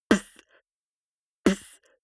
beatbox snare punchy damped

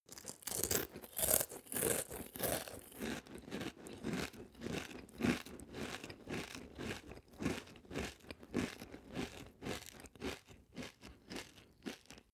male open eat cereals

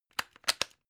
plug plug in clatter

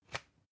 usb port metallic out

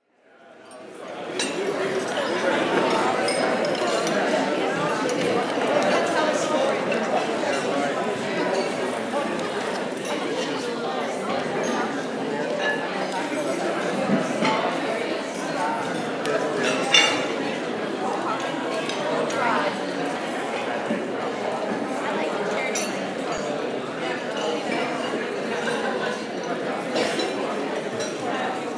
restaurant

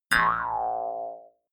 mouth harp

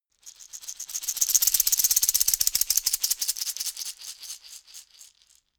shaker wood rattle dynamic

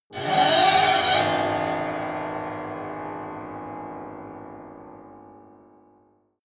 piano bow string broken

